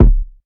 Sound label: trap kick single hit c sharp key; kick drum samples